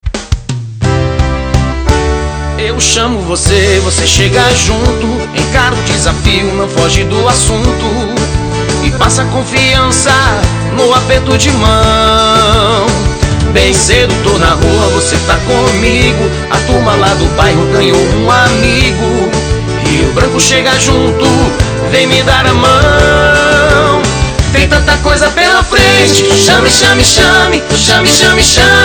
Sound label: Jingle; jingles em ritmo de forro e arrocha; eleitores